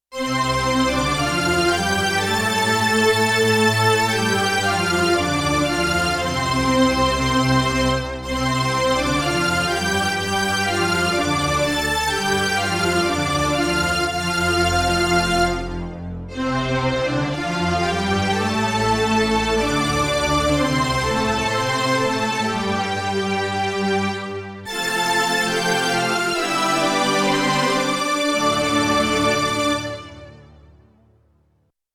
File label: Big Strings; Yamaha TX16W